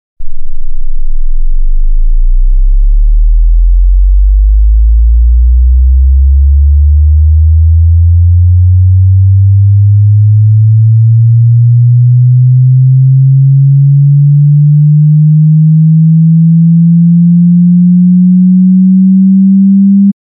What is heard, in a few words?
10 Hz - 200 Hz